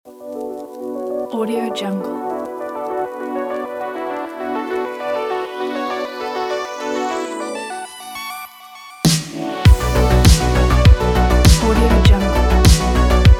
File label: retrowave